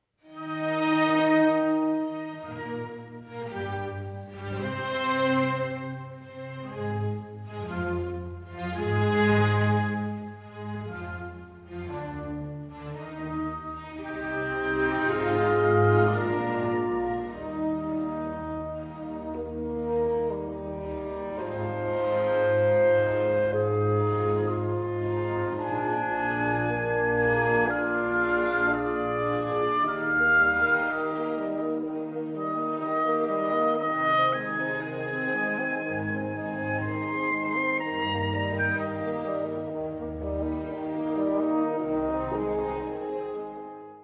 Sinfonia Concertante for Four Winds in E-Flat Major, Adagio